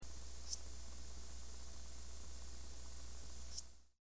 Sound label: fork-tailed bush katydid